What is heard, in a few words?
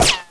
gun hit